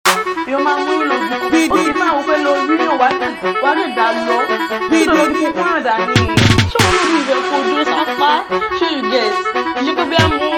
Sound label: Cruise Beat